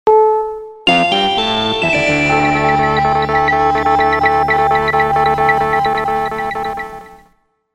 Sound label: Radio News Sounder